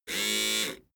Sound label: servo steady